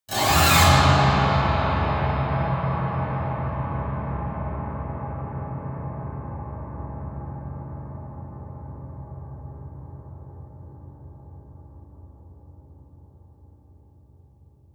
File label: piano twang fast squeal long